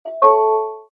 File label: lock unlock chime; nest yale lock